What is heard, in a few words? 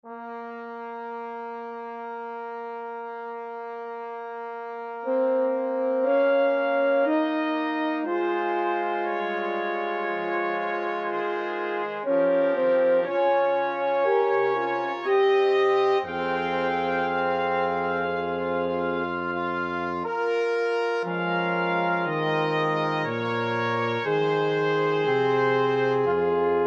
Chorale I - (Brass Choir)